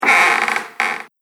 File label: Haunted Creaking Floorboards; Ghost Sound Effects; Horror Sound Effect